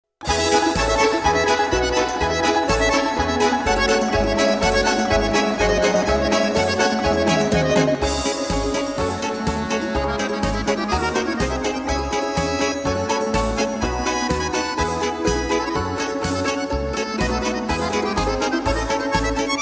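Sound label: balalaika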